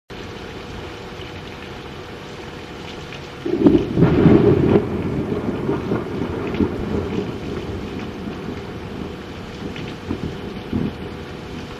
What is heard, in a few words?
Thunder